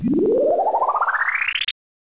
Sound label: Fly Out